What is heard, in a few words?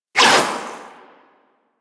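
Primary crossbow Tail; Warframe; Tenno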